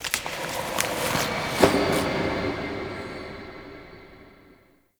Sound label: Echoes Paper Scribble; Ambience; Warframe; Entrati